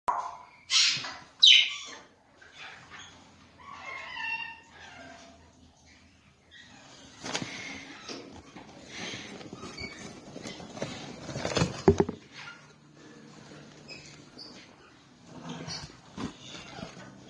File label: sound green check parrot